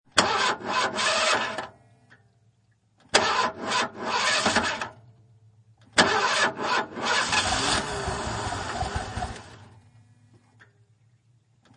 Car Battery Several Attempts, Car, attempt to start several times, sputters; Cars; Vehicles